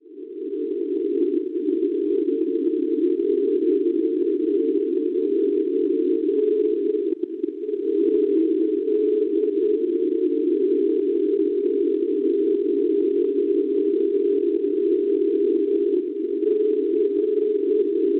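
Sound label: non-directional beacon 311 kHz; CEL; Celle; Schwarzsee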